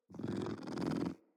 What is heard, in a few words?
Kitten Growly Purr; Enemies; Catbrow